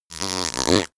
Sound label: fart bright